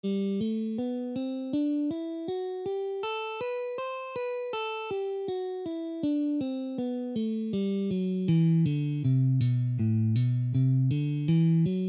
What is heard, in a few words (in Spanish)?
escala de sol mayor posición 2